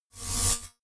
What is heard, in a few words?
notification noise swell double short; kommunikation; signale